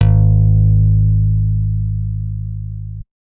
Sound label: guitar acoustic, acoustic guitar nylon